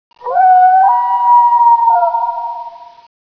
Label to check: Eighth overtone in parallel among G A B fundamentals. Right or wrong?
Wrong